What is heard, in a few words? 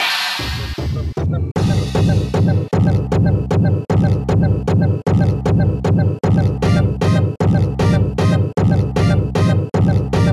Heartbeat; Cumbersome Guys